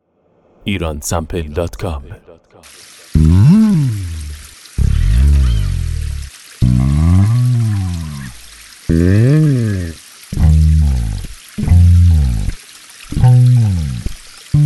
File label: Slide Bass